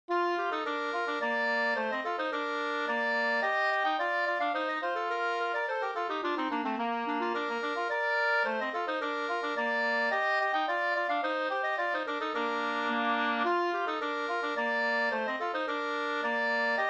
slangpolska 2st

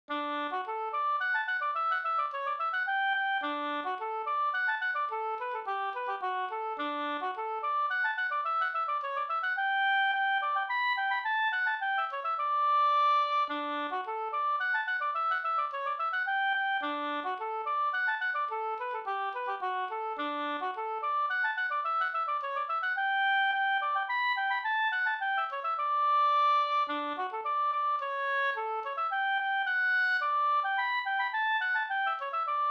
polska Bingsjopolska; Norrbommens polska e Pekkos Olle

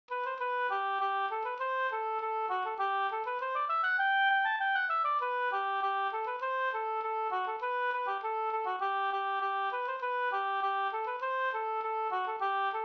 engelska

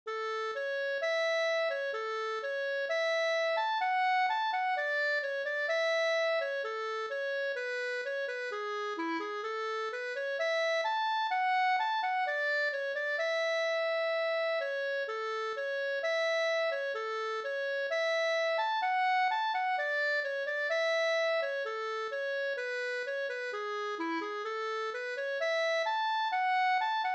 brudmarsch; e Per Myhr

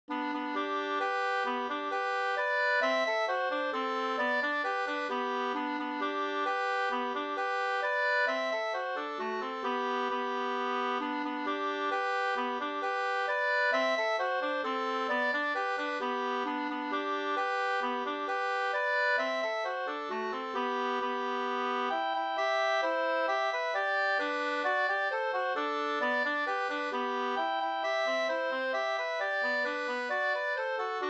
mazurka, 2st; fr Knared Hl Nr2

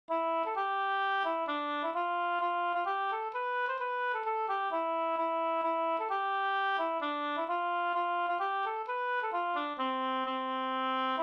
hambopolska; Hall dig val Up

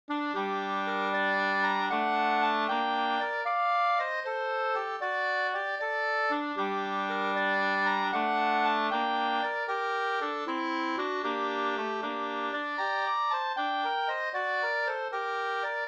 vismelodi

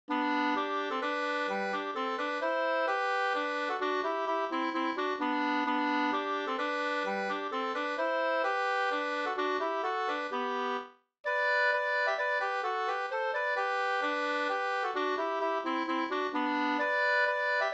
polska

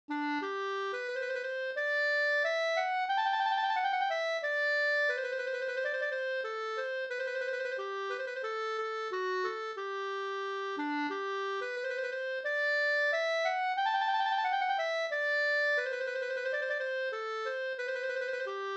vismelodi; Tystbergavisan

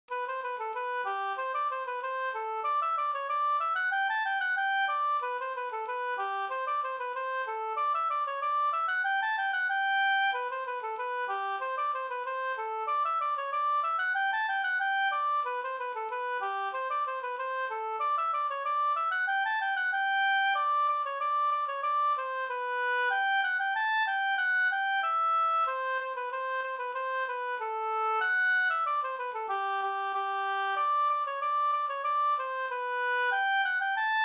polka polkett

